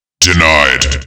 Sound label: denied